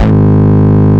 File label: Bass, Root G#; Vengeance Samples